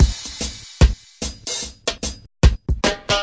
074 bpm reggae crash and two hit, drum loops and breaks